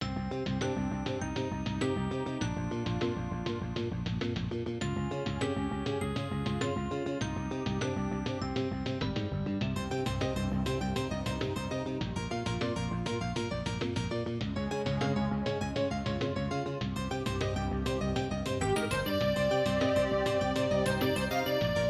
intro, Creative SoundBlaster AWE32 ct3990 external player GM emu; soundcard test; Hocus Pocus